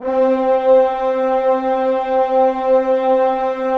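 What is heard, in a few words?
PAD, atmospheric pads; Best Service; XX Large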